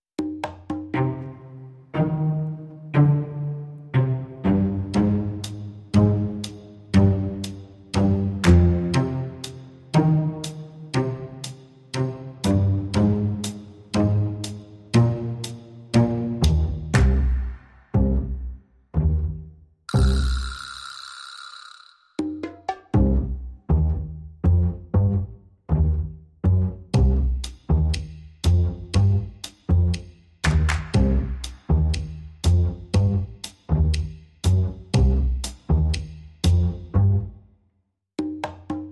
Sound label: Percussion and Basses Only